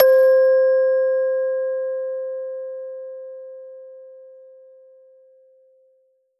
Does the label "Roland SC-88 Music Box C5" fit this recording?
Yes